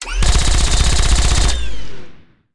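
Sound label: Weapon gatling laser fire; Wpn gatlinglaser fire lpm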